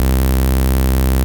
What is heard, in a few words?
Basses C; Vengeance Samples